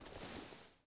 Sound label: body slide gritty, foley